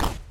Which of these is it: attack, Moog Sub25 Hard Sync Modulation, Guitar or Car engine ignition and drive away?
attack